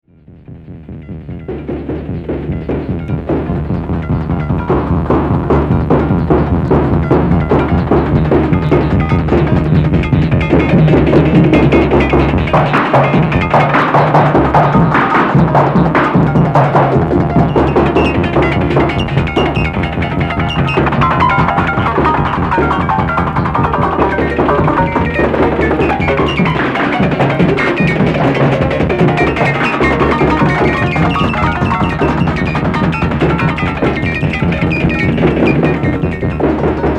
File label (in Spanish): musica electroacustica